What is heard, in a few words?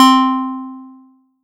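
PLK C4, Roland SH-09 synth pluck; Leads and Keys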